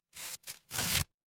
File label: paper fabric tear